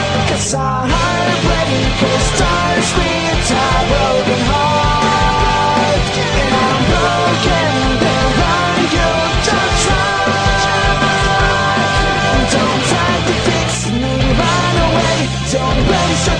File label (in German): deutsche musik klingelton